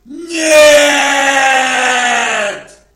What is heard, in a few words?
nyyyeeeeeeeee